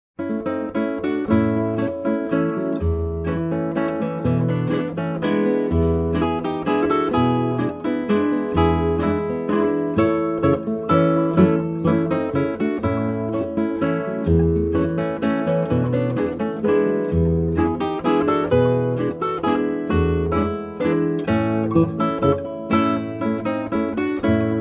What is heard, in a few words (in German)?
Gitarrenmusik Landler